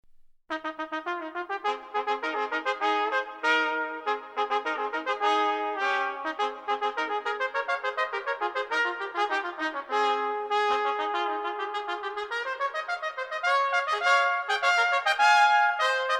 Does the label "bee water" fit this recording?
No